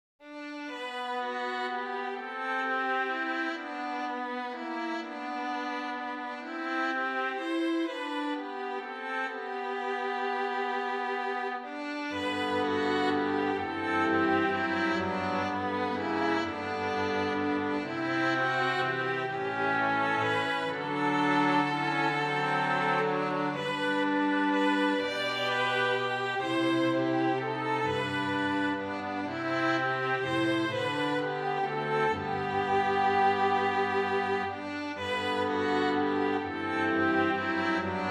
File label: INSTRUMENTAL; I Think When I Read That Sweet Story